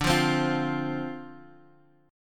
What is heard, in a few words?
D-Minor 7th D, chord